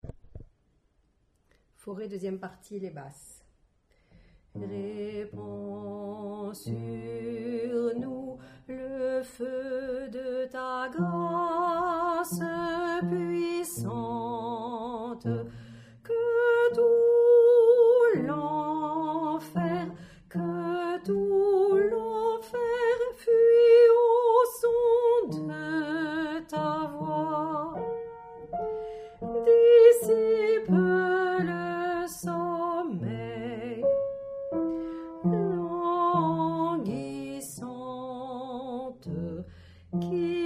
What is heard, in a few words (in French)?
Basse